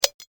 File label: pin pull